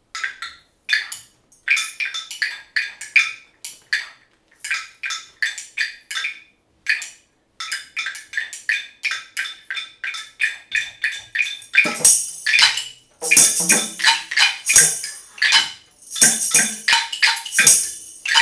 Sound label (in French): polyrythmie